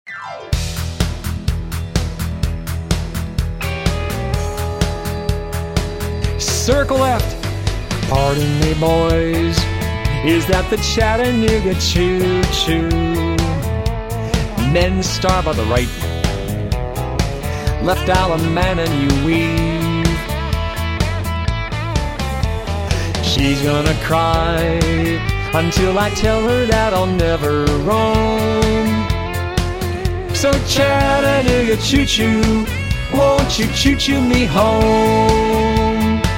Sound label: vocal